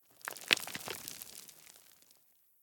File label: Rocks and Dust Movement; Devour; The Town; 3D Environment Clip